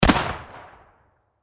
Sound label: Gun Shotgun, Shotgun 12 Gauge Remington 870 Pump Action Single Shot; Close Perspective; Shotgun Sound Effects; Blastwave; Warfare